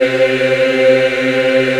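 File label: C3 men women eh, Choir; USB Soundscan vol.28; AKAI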